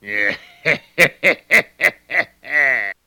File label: man